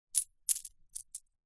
foley money coins small x4 set down into hand stack; zapsplat